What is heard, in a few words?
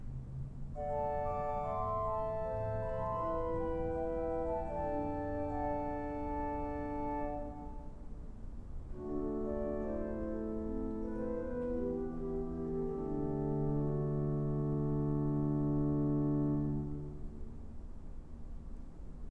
spillflote, organs